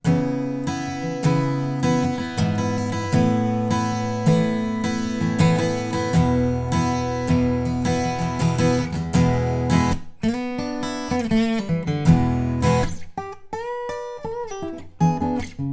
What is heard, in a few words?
Ac Gtr